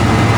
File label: truck run tunnel; Soldier Of Fortune II Double Helix; XBOX; ambience